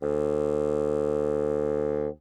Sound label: Bassoon ff D2; Woodwinds